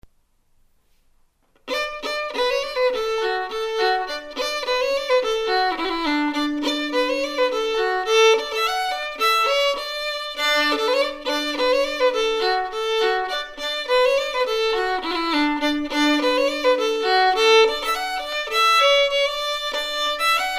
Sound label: Polka, fiddle; Sean Ryans Polka